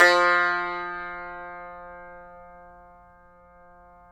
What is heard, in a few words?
tar; Roland - LA Composer vol. 3